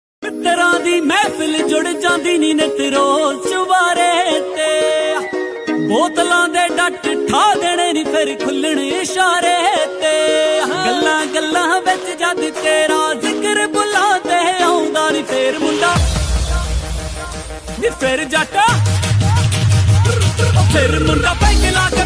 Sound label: Bhangra Punjabi Beats Ringtone